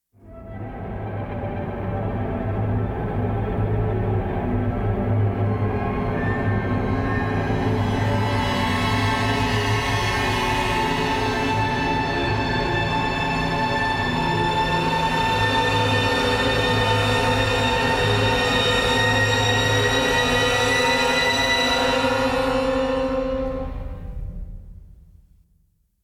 Exterior; Outlast

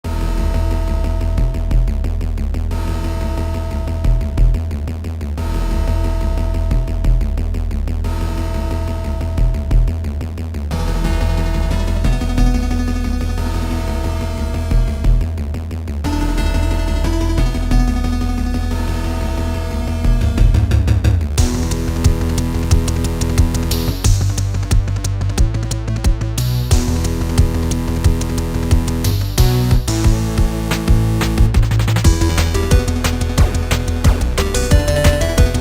8-bit; Grannies' World Tour; Rayman Legends

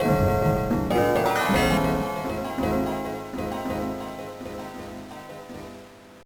Boss Time Limit; Wario Land IV

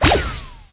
Weapon Magic; Inotia 3

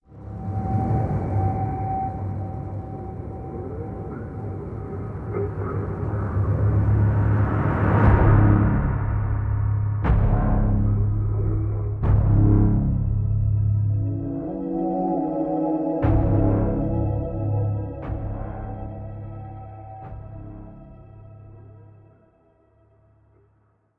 Suspense

